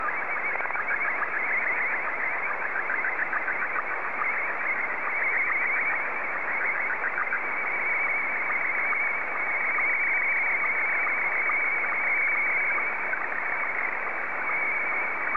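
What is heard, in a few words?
Scottie 1, slow-scan television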